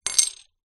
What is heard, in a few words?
bottle top beer metal cap x1 down on table; zapsplat